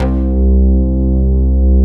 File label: ANA PADS; Pro-Rec - Synthsonic; JV ANALOG1